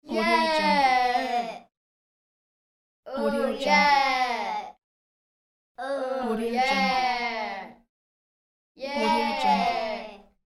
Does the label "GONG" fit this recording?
No